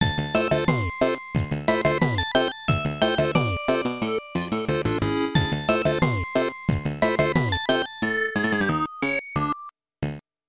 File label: game over; pce